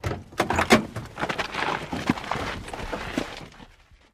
download Sound, Chevrolet Corvair, Interior Pov, Door Open, Quick Entry Or Exit with Shuffling And Seat Movement.